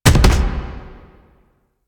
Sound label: Rocket Launcher Punch; Weapons; DE; Warframe; Tenno; Lasrian